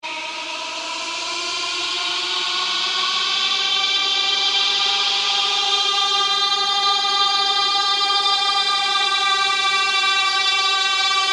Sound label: Ambient Drone Slow Sweep; Sound effects created by manipulating other sounds; Drones (Sound Effects:Sound Design)